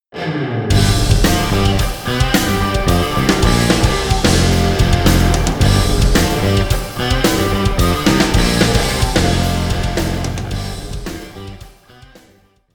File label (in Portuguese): Riff com Reverb